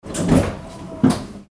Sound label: train door opening